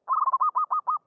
Perkutut

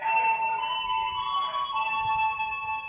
Bel Stasiun

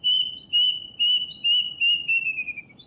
Burung malam

